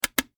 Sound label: Industry Switch Flip Clicks; Factory; Factories; Backgrounds